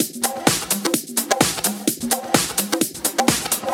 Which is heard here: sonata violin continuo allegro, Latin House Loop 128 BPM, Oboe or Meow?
Latin House Loop 128 BPM